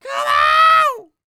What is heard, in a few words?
Vocal Planet R&B & Dance; ILIO